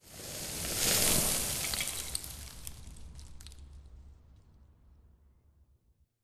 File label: Explosion Rumble Exterior Close Debris; Boomtown; Titanfall 2